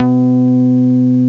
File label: Eurobass C2; Bass Hits